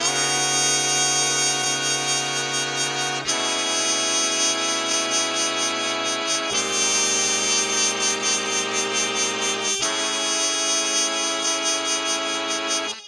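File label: 148 bpm elongated jazz horns; HORN LOOPS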